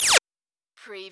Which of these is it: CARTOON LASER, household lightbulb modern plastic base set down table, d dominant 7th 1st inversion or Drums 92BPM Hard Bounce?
CARTOON LASER